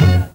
Synth Shot; Vengeance Samples

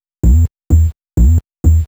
Bassline, 128 BPM; Vengeance Samples; VTDS2 Song Kits; Rap To The Top

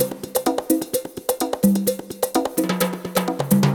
Percussive Loops 128BPM; Vengeance Samples

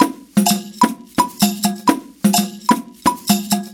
Latin House Loop 128 BPM; Vengeance Samples

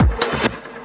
Fill 140 BPM

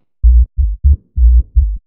Melody Kits 128BPM Root B; Vengeance Samples

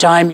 Synth Voc; Vengeance Samples; VEE Synth Shots

